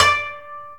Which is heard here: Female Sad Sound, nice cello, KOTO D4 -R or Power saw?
KOTO D4 -R